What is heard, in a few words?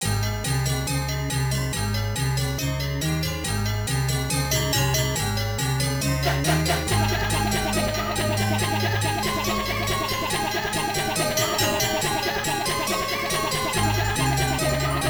Unused Jingle; Tao Taido arcade